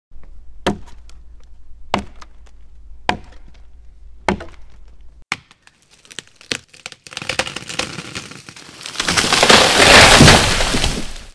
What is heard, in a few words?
axe treefall